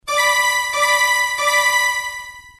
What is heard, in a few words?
alarm